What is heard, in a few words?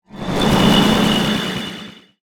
Sci-fi Transition